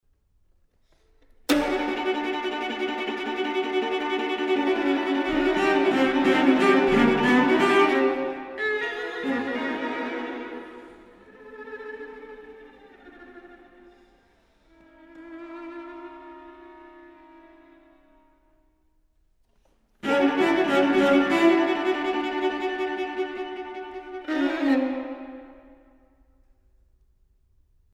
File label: microludes for string quartet